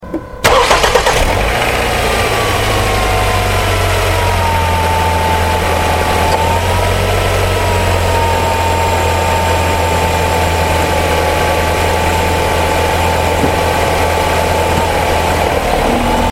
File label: Mondeo Ignition Outside